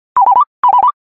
Nokia Signum

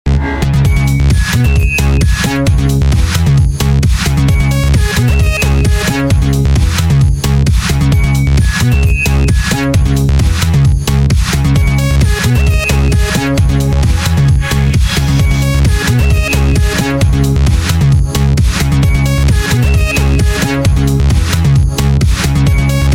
Vertu Remix

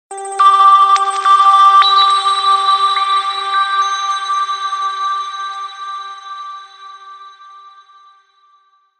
Ringtone

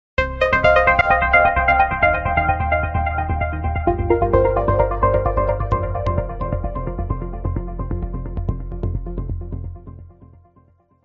Nokia Float, ringtone

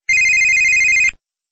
Motorola ringtone

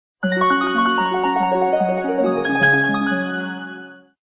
LG Harp, Ringtone

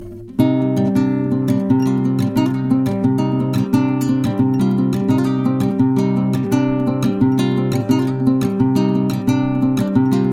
Acoustic Guitar, Ringtone

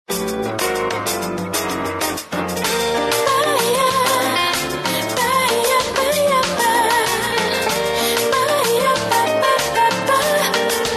LG, Ringtone

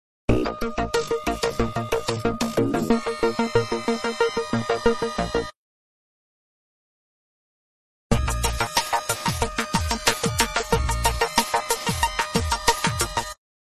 Sony Ericsson, ringtone

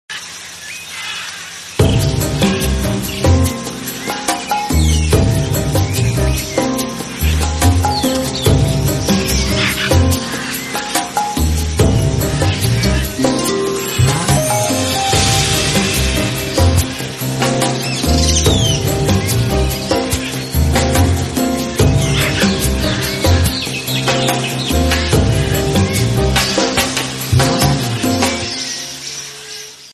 Samsung Galaxy S3, ringtone

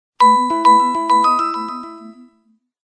LG Echo, Ringtone

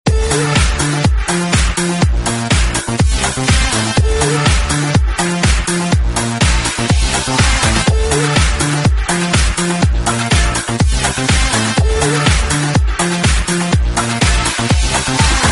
Samsung Galxy Tune, Ringtone

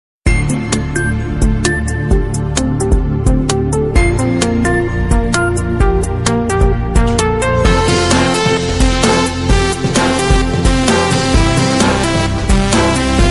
Sony Ericsson Xperia X2 SMS, ringtone

